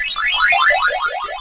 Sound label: entering; mazeofgalious